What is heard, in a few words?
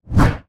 GUI Swipe